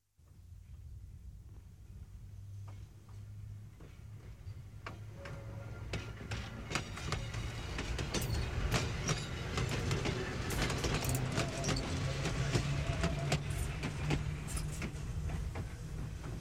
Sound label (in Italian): TRENO PASSAGGIO; trasporti; treni